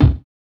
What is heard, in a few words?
BD; E-Lab; X Static Goldmine 1